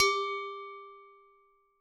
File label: Metalshaker, Household; Convolution; NI Kontakt 5